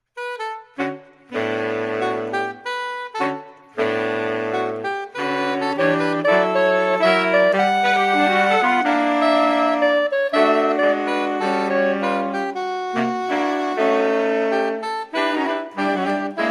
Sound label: Saxophone Quartet